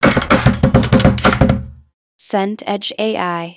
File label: door knock urgent